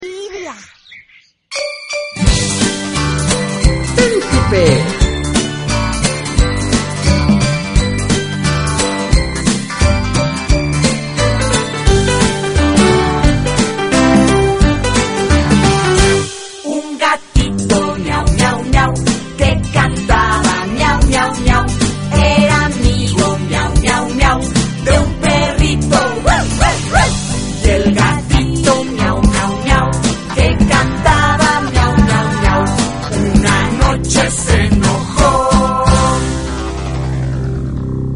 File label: pipe